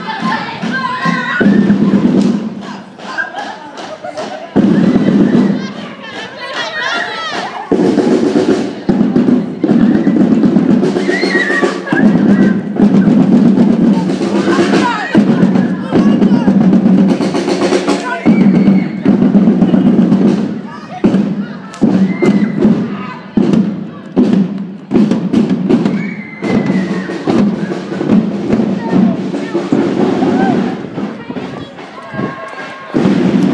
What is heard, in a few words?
christmas drummers